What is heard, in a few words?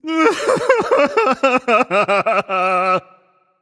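nonverbal boohoo; voicepack; smite; merlin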